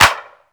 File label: clap one shot f sharp key; percussion; single hits one shots